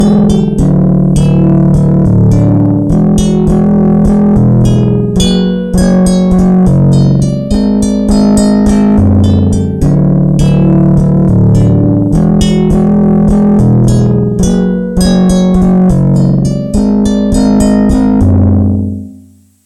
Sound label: guitar loop; modelonia; guitar dry loop